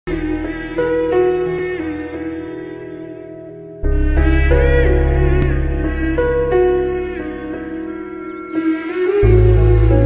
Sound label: sad bgm; karthi awara movie